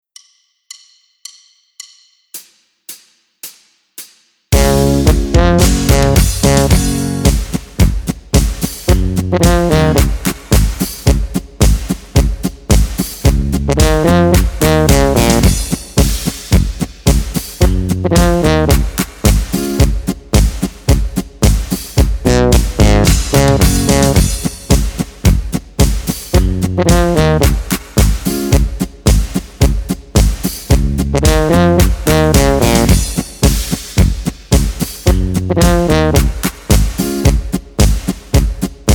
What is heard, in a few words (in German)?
ohne Begleitung